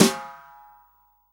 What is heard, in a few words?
SD, REAL DRUMS, SWING KIT